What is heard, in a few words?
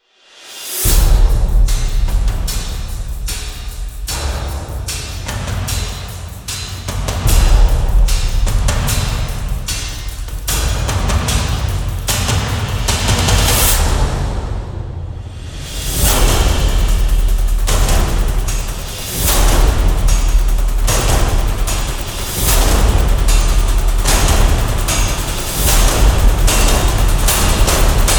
percussion only version